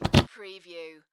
Whoopee Farting Blowing Raspberry Sound

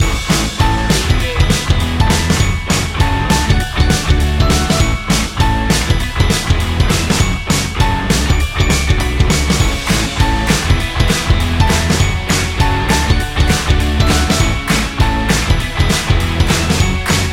cheerful indie rock loop

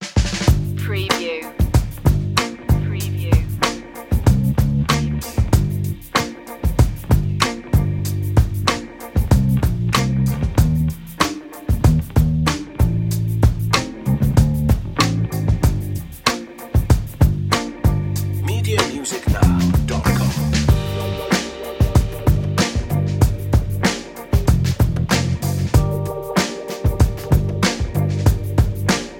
retro funk; short

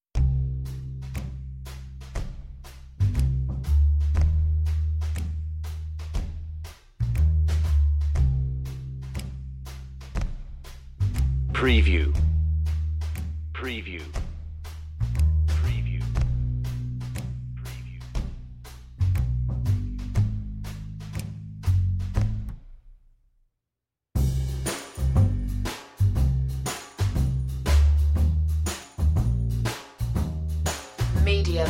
only bass and drums

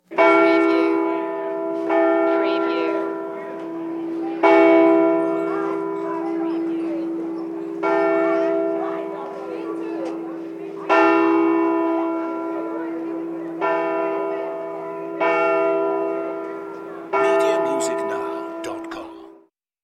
Clock Bell World Fair; 1965

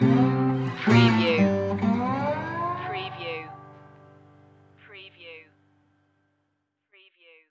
delta mercy stinger

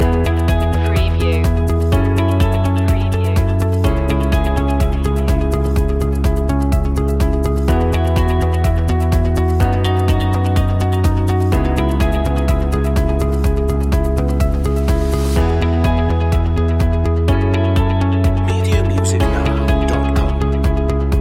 steady progress loop

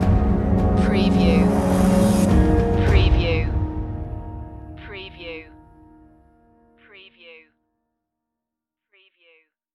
beautiful stinger

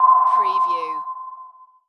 Submarine Sonar Ping

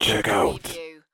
checkout

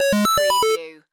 Chime Modulated

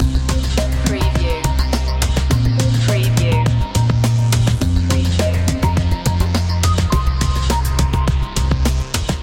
ambient breaks loop

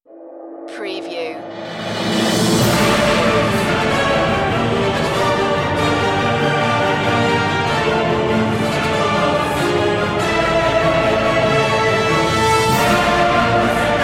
grand ending only

